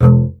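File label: string contrabass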